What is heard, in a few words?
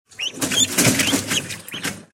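chick screaming in cage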